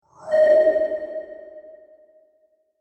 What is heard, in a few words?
Whoosh Transition Materialize Airy Vocal Element Reverb; Whooshes; Sound Design